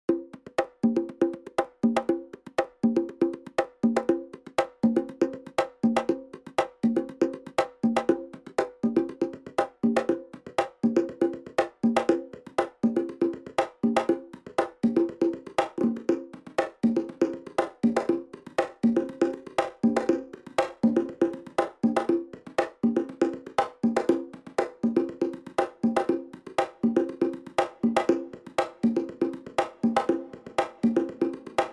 Logic ProX Percussion Loop Chroma Size Time Distance